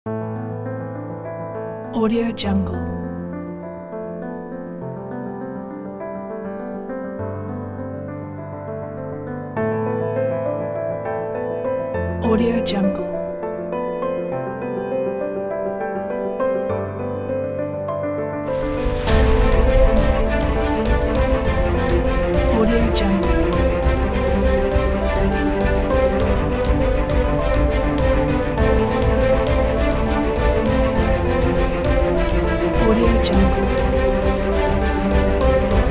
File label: epical